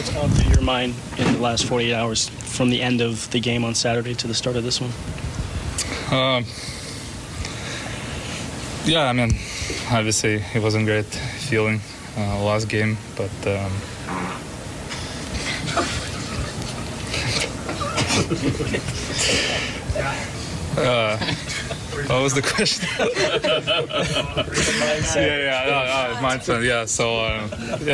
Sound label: POST GAME INT FART; ANDREI VASILEVSKIY